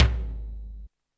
Yamaha RM 50 Toms

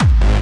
Kick n Bass

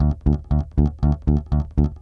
Eighth Notes Rep, Bass Drum - Kicks

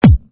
kick

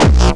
bombo, kicks, Hardcore

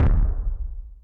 kicks, Bass Drum

